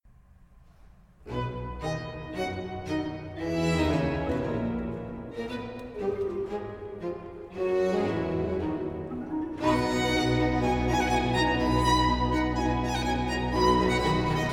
C major for 2 violins and basso continuo and organ obbligato Allegro